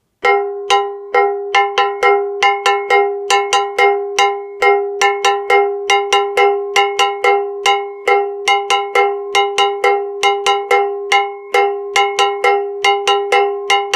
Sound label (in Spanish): cencerro campana de bongo para salsa en 2-3